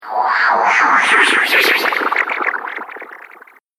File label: Spinning Liquid Spell Build Up, magic sound effect